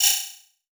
Multimedia Internet CD-Rom Flash Click Mechanical

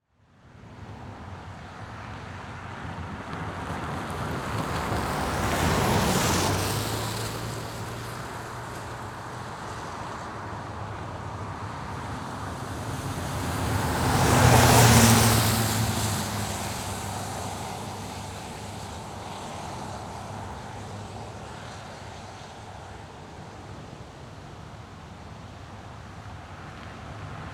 Cars Driving Down Wet Dirt Road; transportation

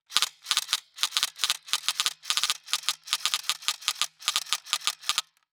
Cartoon Toy Gun Fire Automatic Sporadically

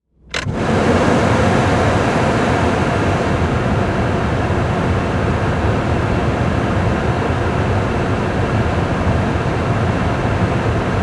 Transportation Jeep Commander Run Heat On High

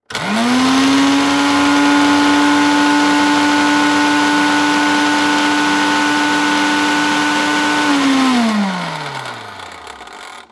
Household Blender Run High Speed

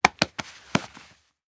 Human Body Grab

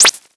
Flash Digital; Multimedia Internet CD-Rom Flash

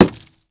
Weather Snowball Hitting Wood

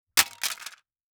CD Case Drop

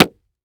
Foley Footstep Work Boots Concrete Jump Quick; Foley Footsteps

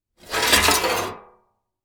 Foley Metal Object Slide Short

